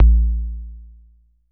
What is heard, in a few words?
KICK, DRUMS; Music Store Sound Service; Einstein